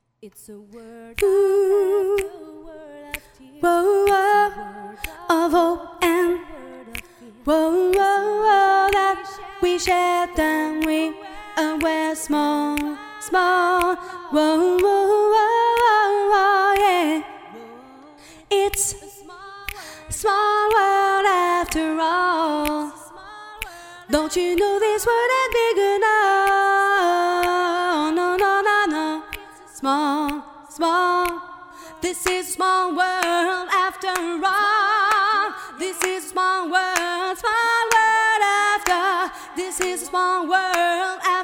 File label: acapella